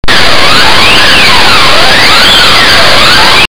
weird noise, This is some weird varying tone weird noise tone of varying tones; This is some weird varying tone weird noise tone of varying tones i found